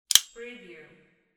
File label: multi plier close